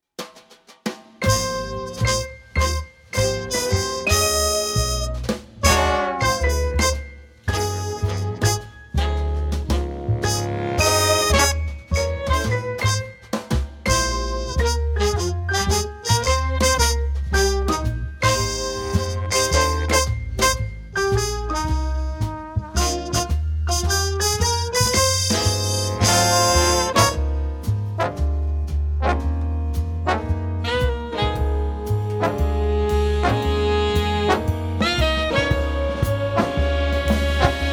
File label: wsu fight jazz